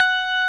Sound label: Roxichord F#5, RMI E.Piano; Keyboards of The 60's and 70's